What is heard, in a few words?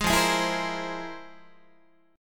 F Minor Major 11th Guitar, chord; strummed; JGuitar